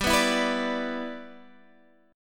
G 6th, Guitar, Half Step Down

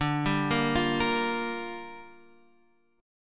G Minor 7th, D

